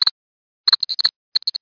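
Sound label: cricket chirping; kunchong jiaosheng; xishuai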